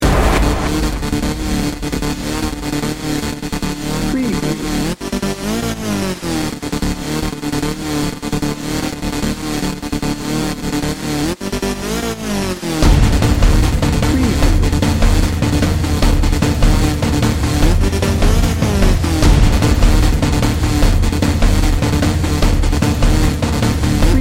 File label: from the ashes dnb